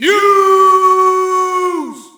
Shout, audience; UFC Tapout 2

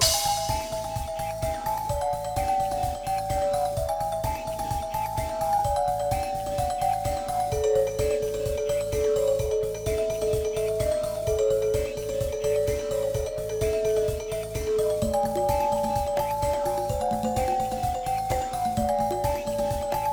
music beach; Ty The Tasmanian Tiger 2 Bush Rescue; XBOX

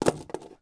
blaster drop wood; objects; Star Wars Obi-Wan; XBOX

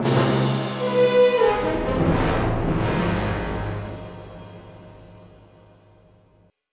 battle lose, music

